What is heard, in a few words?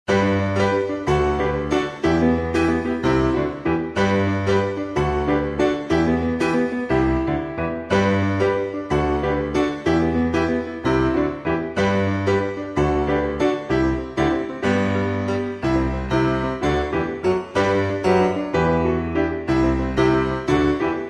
Harmonious Ringtone